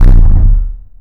heatray fire, weapon

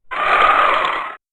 chicken flyer big

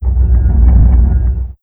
rumble

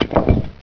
death, explosion; clogger

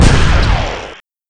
missile fire

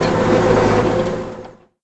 tank move

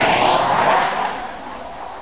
fighter move

